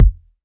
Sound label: BD, Waldorf Blofeld